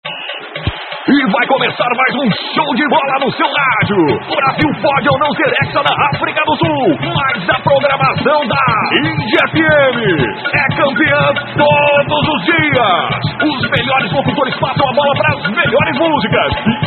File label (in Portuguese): vinhetao copa